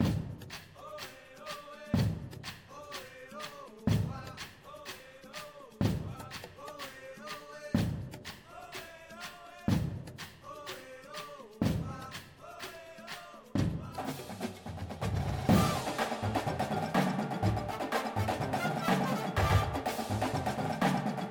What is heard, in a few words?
full drumline with music